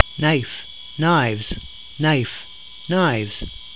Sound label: knife